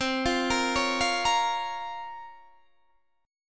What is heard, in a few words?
Bb Minor C, Bbm/C; Bbm/C arpeggiated